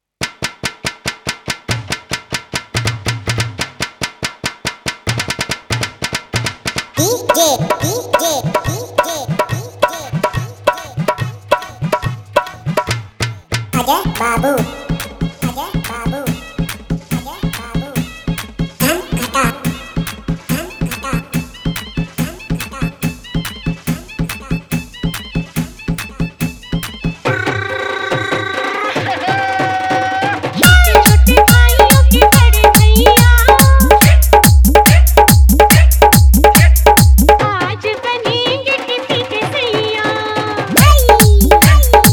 Hindi Old Mix

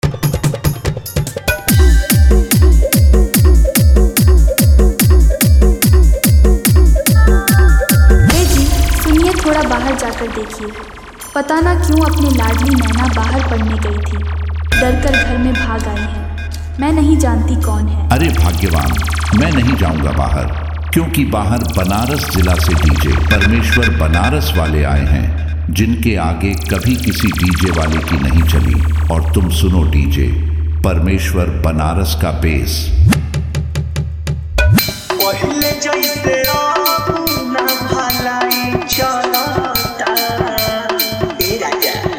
New Bhojpuri Dj Remix Songs Full Bass Mix Song

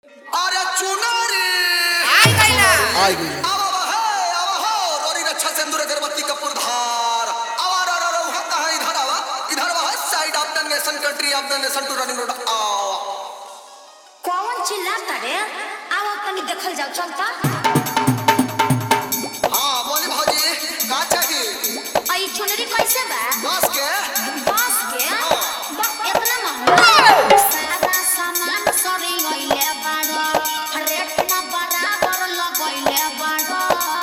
New Jhan Jhan Bass Dj Remix Song

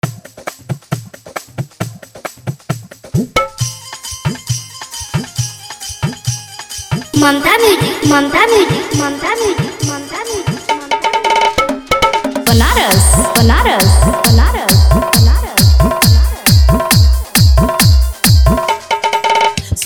Old Bhojpuri Dj Remix Song

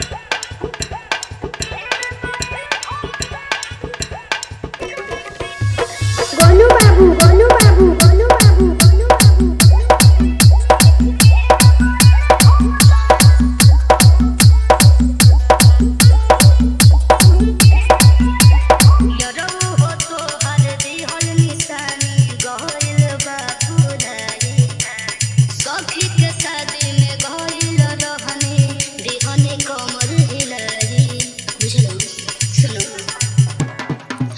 New Bhojpuri Dj Song Hard Vibration Mix

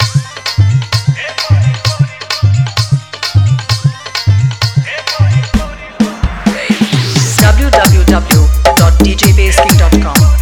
Hard Vibration Mix

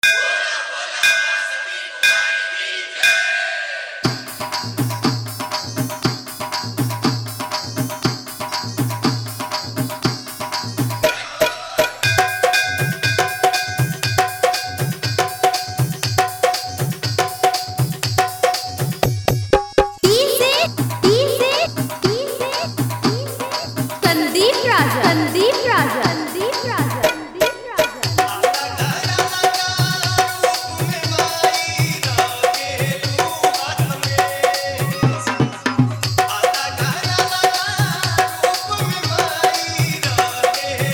Old Bhakti Hard JBL Bass Dj Remix Song

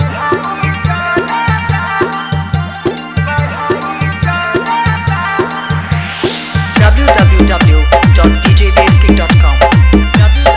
Hard Vibration Mix

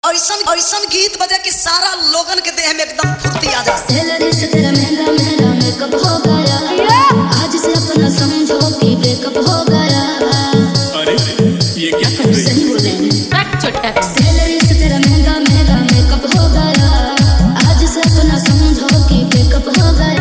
New Bhojpuri Dj Remix Song

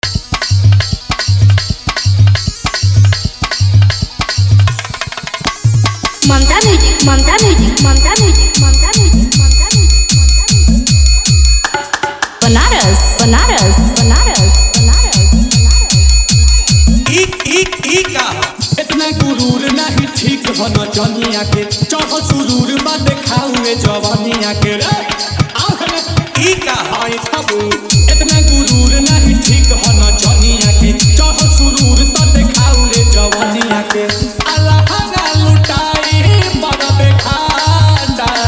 New Bhojpuri Dj Remix Song

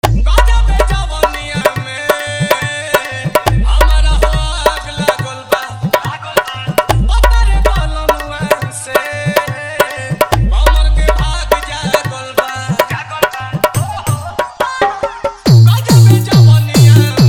Hard Vibration Bass Bhojpuri Dj Remix Songs

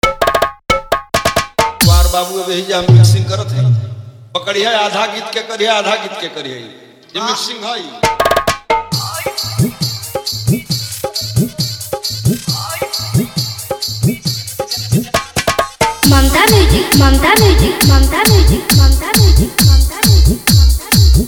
New Bhojpuri Dj Remix Song

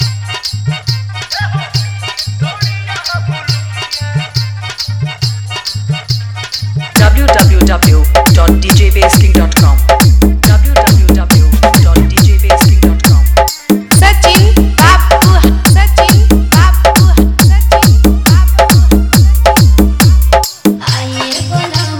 Hard Vibration Bass Mixx

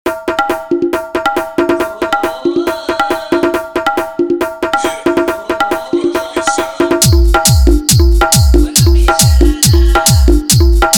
Hard Jhan Jhan Toing Bass Mix

